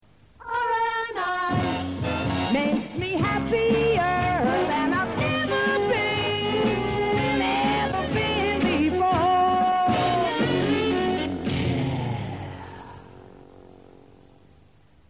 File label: jingles